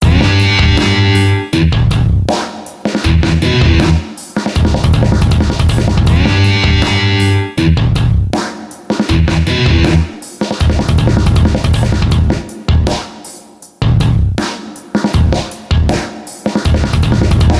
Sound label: Electro Ride